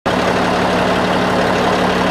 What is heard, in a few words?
Frost Inaction